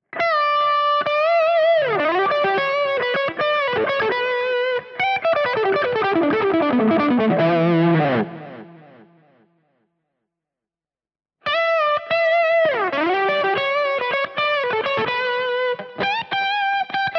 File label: All positions Mid Gain Lead; DAngelico